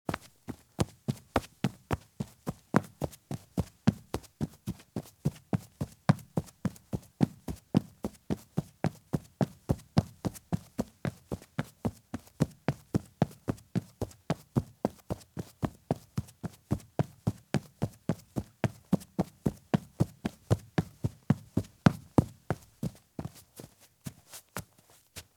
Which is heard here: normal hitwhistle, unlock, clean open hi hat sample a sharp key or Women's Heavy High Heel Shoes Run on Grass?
Women's Heavy High Heel Shoes Run on Grass